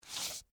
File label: Footsteps, Boots, Scrape, Concrete; Human; Blast Wave